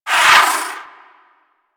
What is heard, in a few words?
Space Fighter Dredger Rocket Proj Whizz By; Rail Jack; Enemy Ships; Grineer; Agent